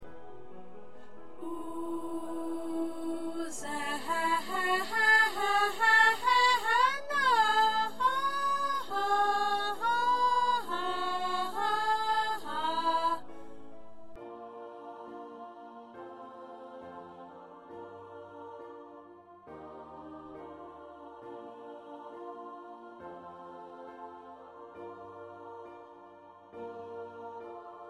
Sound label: Ensemble Soprano